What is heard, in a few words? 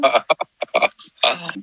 ahahaha